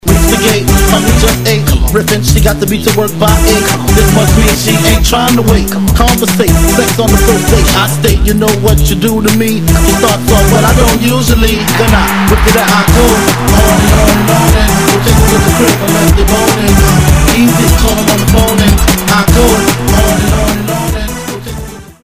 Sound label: 150bpm; Go Go